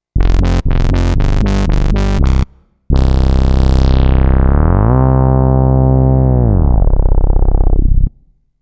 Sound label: Rubber Bass; AX Edge